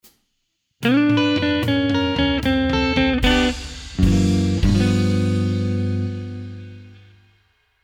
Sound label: Slow Blues Ending in G